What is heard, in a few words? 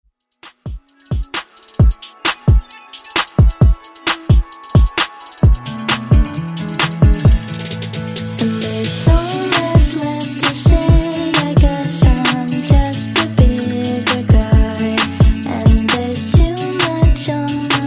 132 Bpm; Redrum